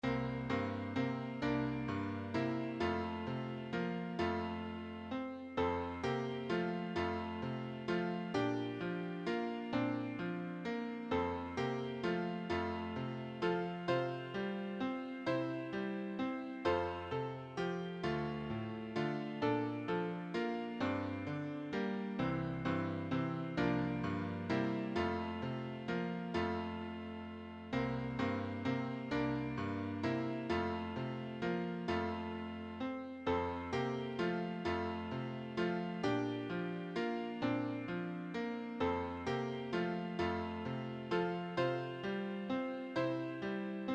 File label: piano acc; church and sunday school music; Hymns